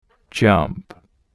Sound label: jump